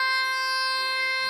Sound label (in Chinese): 哭声; 大和; 赛马娘